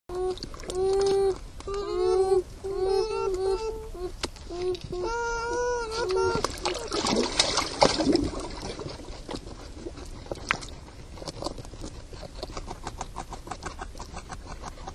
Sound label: Geschwister Brothers Sisters, biber